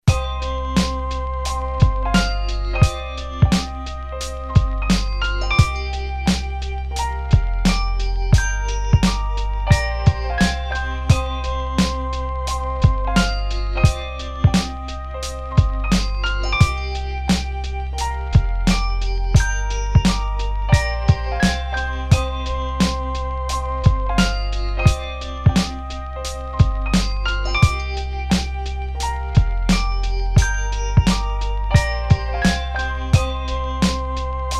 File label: orchestra beat